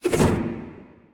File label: basic spin; Smite; Cabrakan